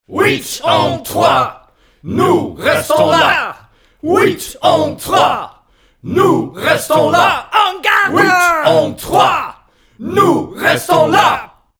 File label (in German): Schrei ohne Bomben